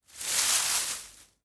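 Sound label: short sound of bushes rustling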